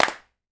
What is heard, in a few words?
CLAP L; Big Fish Audio; Ross Garfield Drums VOL-2; TOPEKA KIT